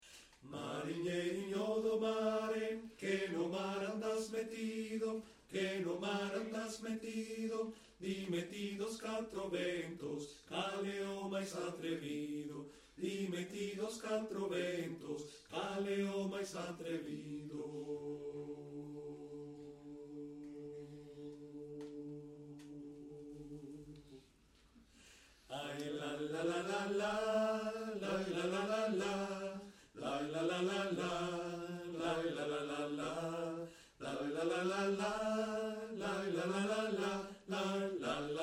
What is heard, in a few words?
muñeira bass